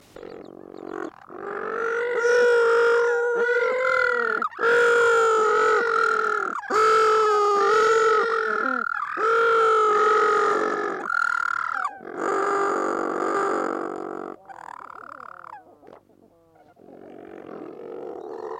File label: Blue Penguin Northern